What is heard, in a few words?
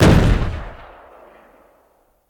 tank cannon; factorio; Factorio 0.14.21; fight